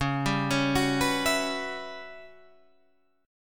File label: E Minor 6th Add 9th C sharp chord; Em6add9/C# arpeggiated